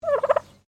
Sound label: Bird Turkey Domestic Call; Birds (Sound Effects:Animals)